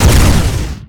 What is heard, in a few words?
Gun Assault Rifle Power Shot, Weapons